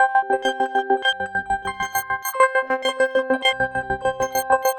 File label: synth 100 glitch C G; Transmission-X